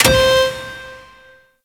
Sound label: Depressurize Light Off, Ocean; Ambience; Warframe; Grineer Ocean